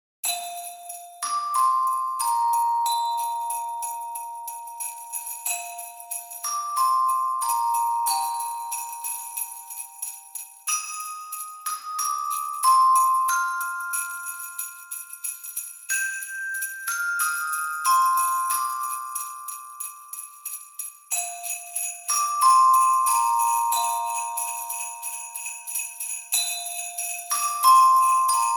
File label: Christmas Bell; kingfisher christmas samples